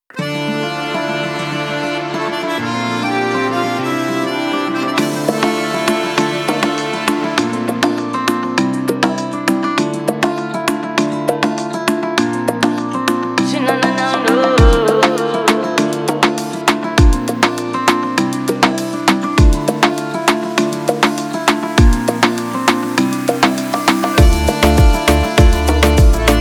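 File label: ispanyol latin reggaeton accordion; By Burak Sound Bank; latin ispanyol tema vol 1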